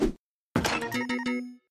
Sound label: wrong answer